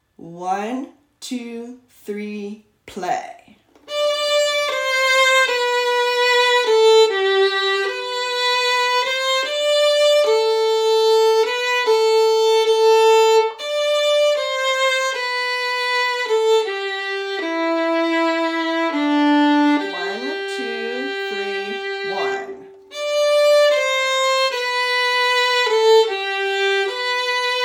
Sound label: violin; South wind